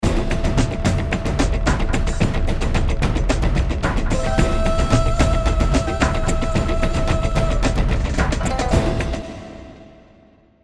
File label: Trailer Stinger